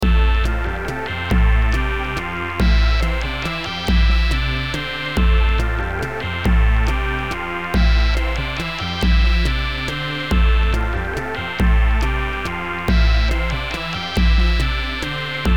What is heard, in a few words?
beat